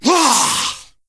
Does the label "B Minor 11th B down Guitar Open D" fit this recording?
No